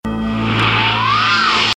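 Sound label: Disintegrate Beam; Gort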